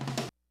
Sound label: HITS BITS; Kid Nepro - Elite